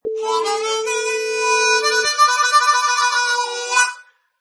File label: Harmonica Riff